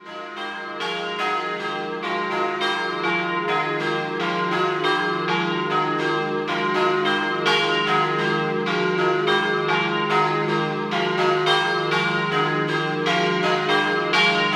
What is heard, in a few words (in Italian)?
campane Doppio; Codroipo